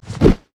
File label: basic attack; karthus; lich